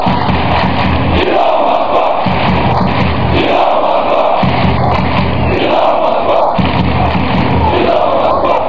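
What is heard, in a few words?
fanchants, football chants; dynamo moskva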